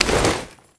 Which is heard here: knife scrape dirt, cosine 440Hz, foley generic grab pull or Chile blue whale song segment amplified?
foley generic grab pull